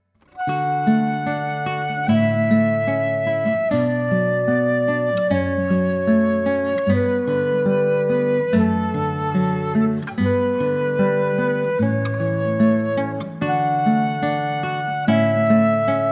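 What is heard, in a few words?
Canon In D Flute Guitar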